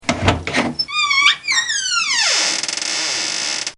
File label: Door Wooden Open W squeak